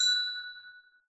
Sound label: ice chime, note; Minecraft; block